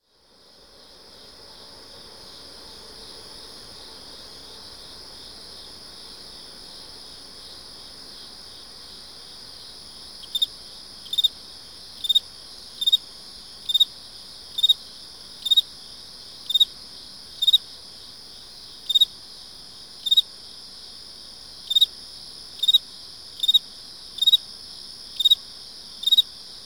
animals insects field cricket chirp close by others in background